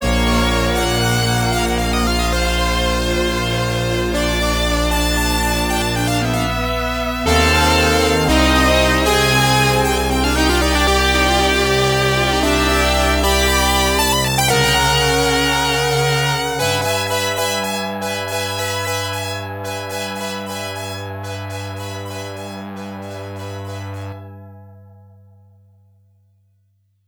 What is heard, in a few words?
gameover; Assault Suit Leynos 2